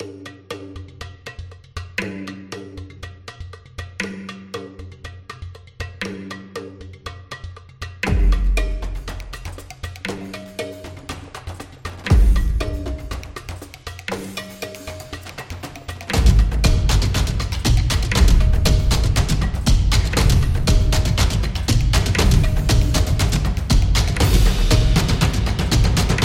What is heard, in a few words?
African Beat